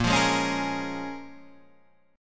Bb Minor 7th

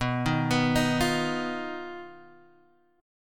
B Major Flat 5th, guitar chord

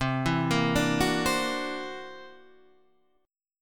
C 9th Flat 5th C, C 9th Flat 5th chord

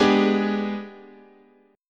G sharp Minor Major 7th Flat 5th, chord

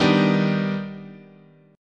Eb Augmented Major 7th, guitar chord

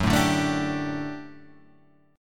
D Suspended 4th F sharp, guitar

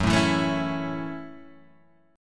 F# Minor 7th

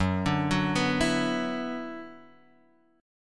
Gb 7th Suspended 4th Sharp 5th Gb

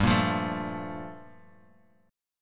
G Minor 9th, Guitar

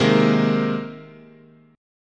C Minor 7th Sharp 5th, guitar chord

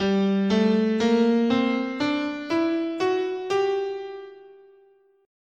G Melodic Minor, scale

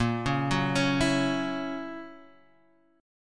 F sharp 7th, guitar

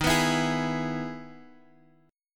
E-Major D sharp

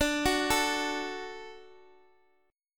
D Major D down Guitar Open D

